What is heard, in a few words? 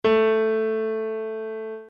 Piano A